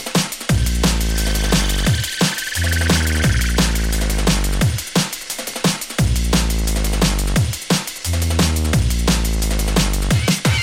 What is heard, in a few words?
gutter basslick